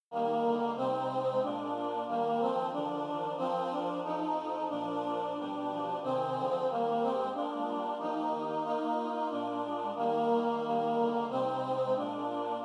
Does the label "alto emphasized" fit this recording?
Yes